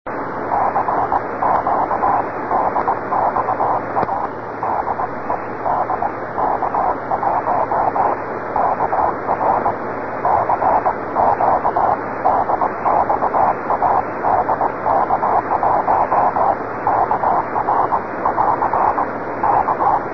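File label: CW cq aurora, 144 MHz; DK1KR JO53HW 497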